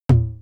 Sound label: Tom Analog, Yamaha RM1X; AnlgKit01